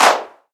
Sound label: clap single shot f sharp key; percussion; single hits one shots